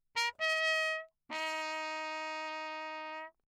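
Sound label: trumpet mute Bach signal; Perinétventiltrompete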